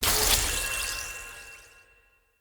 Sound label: Stag Energy Drain Ally Heal; Powers; Stag Pact